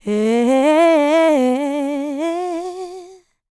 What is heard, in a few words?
LICKS, Vocal Planet, R&B; ILIO; Dance; LT R+B